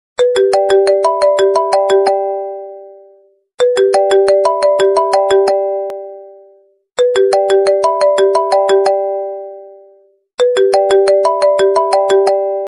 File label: new morning alarm ringtone calling ringtone iphone 11 ringtone